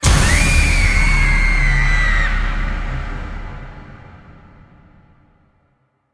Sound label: big Win End; halloweenjack